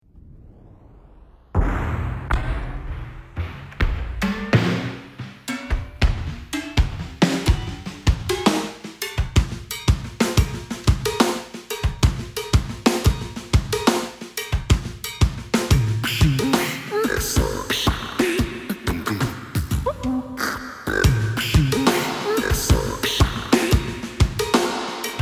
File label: drum; citypercussion